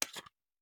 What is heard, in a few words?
Hand Gun Foley; Sound Ideas; Guns